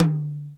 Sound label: Roland U110 Drums C2